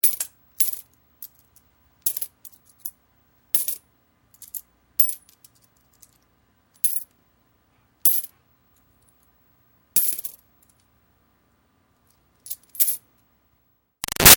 Foley Coins Drop on Coins Singles; Lite Handling; BigRoomSound

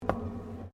Footsteps Boots Metal Spiral Stairs Steps; Footstep Foley; Footsteps (Sound Effects:Human)

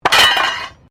METAL SPIKE FALL; Materials

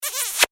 comedy kiss; Comedy / Cartoon Kiss; Kissing (Sound Effects:Human); McKinney Sound

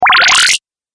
Liquid Laser, laser gun; Twistedtracks

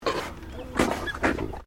ANIMAL ALPACA MALE GRUMBLE; Alpacas on a farm in Gippsland Victoria; Miscellaneous Animal; SoundEffects-Foundation1

